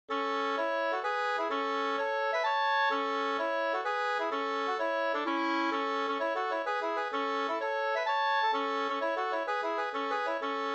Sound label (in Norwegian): pols; Norskleitjen no 2st